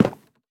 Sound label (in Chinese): 樱花木 栅栏门 开关声